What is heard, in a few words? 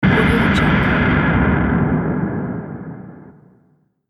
distorted smash impact long